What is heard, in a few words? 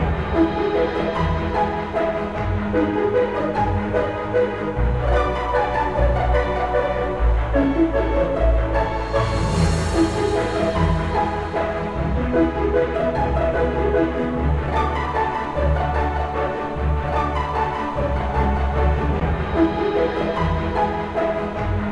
Story Music